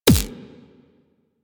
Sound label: Rail Jack Shield Hit Notification; Enemy Ship